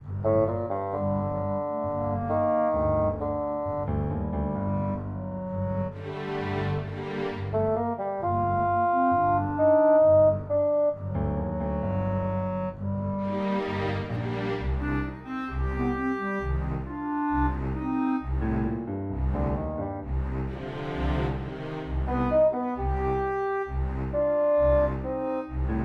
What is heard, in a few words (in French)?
suspens orchestral; brunette music